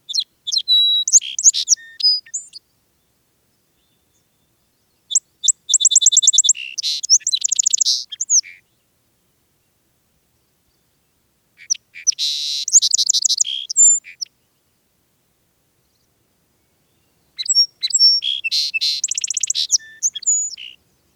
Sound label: Song Sparrow; Lang Elliott